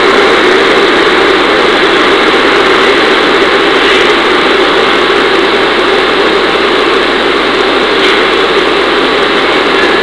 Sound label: static